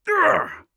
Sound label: Grunt Fast; Warrior; Hi-Tek Trooper; Realm Royale